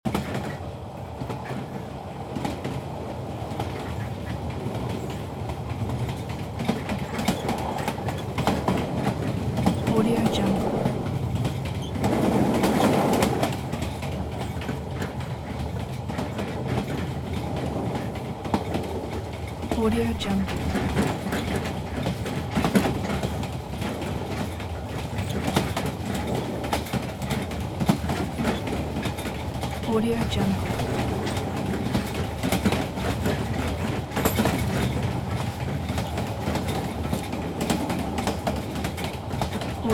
transportation train ride interior reunification express; vietnam